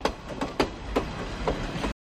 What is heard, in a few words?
Diesel Train Wheels, rails & rumbles